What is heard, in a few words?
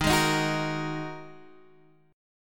Ab 7th Suspended 2nd Eb